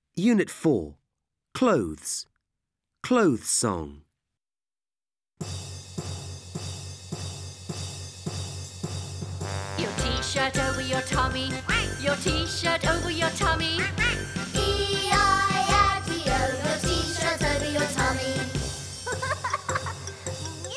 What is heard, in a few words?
Clothes song